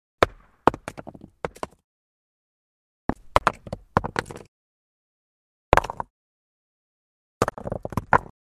Small rock hit hard ground

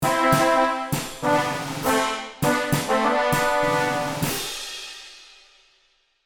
You win success victory fanfares; cartoon ascend

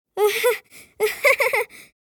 Little boy laughing

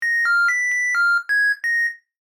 Dishwasher cycle end jingle; appliances

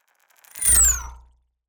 Futuristic whoosh transition

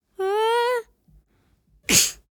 Young boy sneezing; gfxsounds

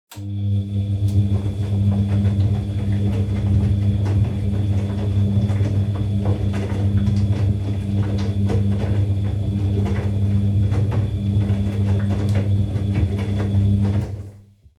Dishwasher running constant; appliances

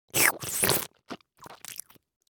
Spaghetti sucking pasta eating

